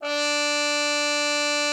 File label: CUP MUTE, D4; Xsample VOL-3